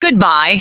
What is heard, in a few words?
shutdown; Sanyo; RL-4930; Startup and Shutdown